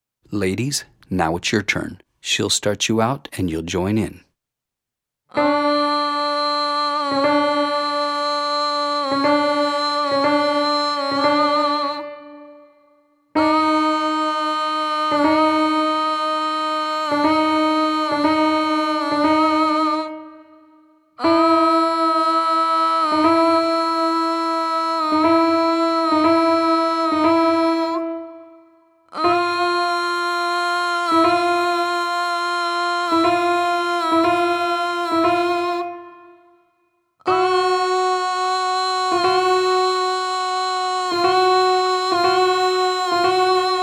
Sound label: Note Delineation Down a Half Step Female